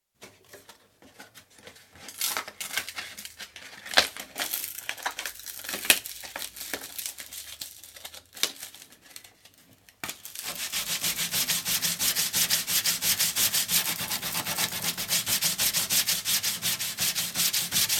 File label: Sanding, paper folded then small piece of wood; Sander; Sanders; BBC